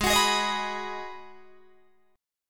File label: G sharp Minor 7th G sharp, Guitar Open D